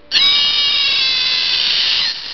SCREAM

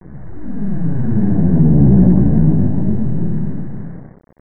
WHOOSH; EPIC

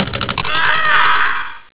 EJECT

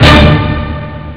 pling

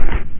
SPIKE

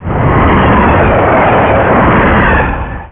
train

